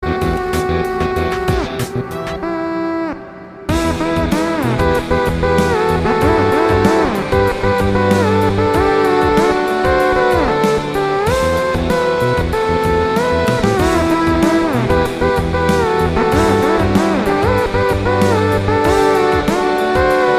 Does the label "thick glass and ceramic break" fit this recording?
No